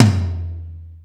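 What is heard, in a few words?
acoustic tom, drums; East West; Global Sounds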